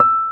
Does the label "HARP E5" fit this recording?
Yes